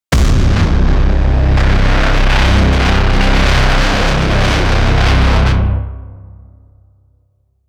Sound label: cinematic horror trailer, deep impact